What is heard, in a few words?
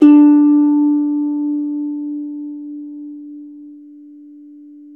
CELTIC HA, Celtic Harp